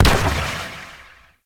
Multi Gun Overcharged Explosion; Enemies; Abilities; Warframe; Corpus

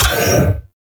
Library Hologram Turn Off; Warframe; Duviri; Library Island

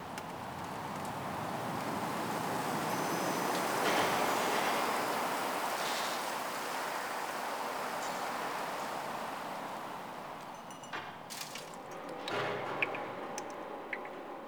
Winter Exterior Small Bed Loop, Ambience; Warframe; Nineteen Ninety Nine

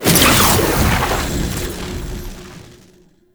Mirror Portal Activation; Warframe; Duviri Murmur

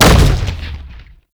Rupture Pillar Burst; Roathe; Warframe; Tau; Abilities

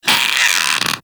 Vocal Pain, Infested; Splitter Split Top; Grey Strain

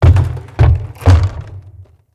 Castle Siege Roof Boss Bang Door B; Encounters

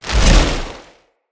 Casting Device Cast Heavy; Weapons; Player

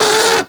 Combat Vocal Pain; Warframe; Catbrow

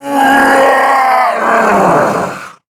Attack Roar; Warframe; Sargas Ruk Boss

